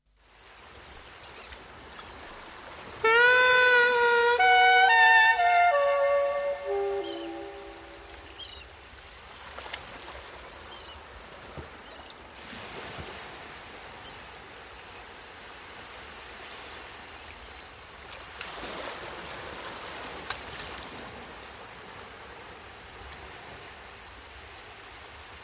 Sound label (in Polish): gitara